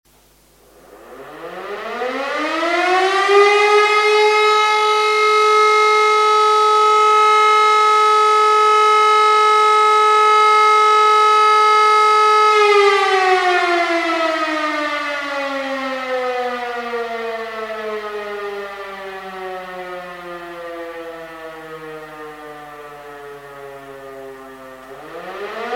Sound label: german fire sirene